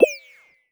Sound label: Choose, User Interface Menu; Advanced UI